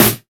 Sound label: natural snare g sharp, Royality free acoustic snare tuned to the G# note. Loudest frequency: 6014Hz; snare drum samples; one shots